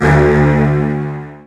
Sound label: Virtuoso Strings, edgy, D1; ILIO; Kirk Hunter Virtuoso Strings VOL-2; 90 s Sample CDs